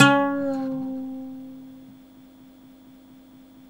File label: OUD GLS DWN C, Mediteranean Traditions